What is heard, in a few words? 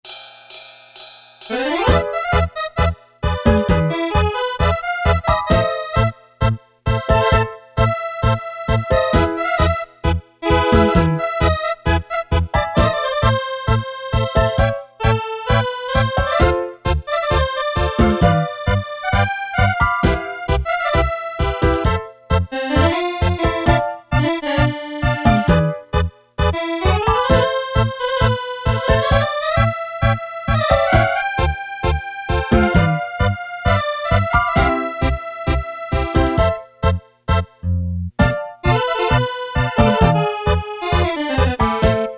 Tango, Casio CT-370, Rhythms